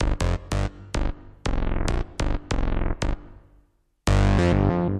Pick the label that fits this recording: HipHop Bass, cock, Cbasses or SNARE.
HipHop Bass